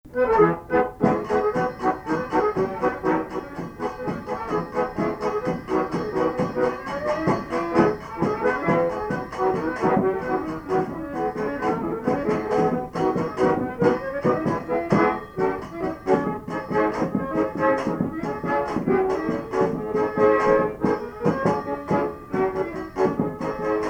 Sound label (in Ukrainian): polka